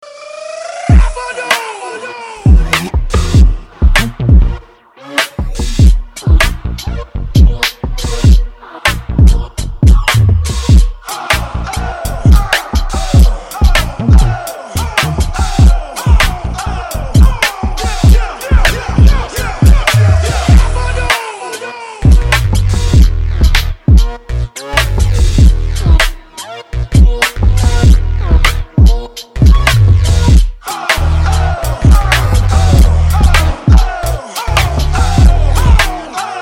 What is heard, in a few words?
Hip-Hop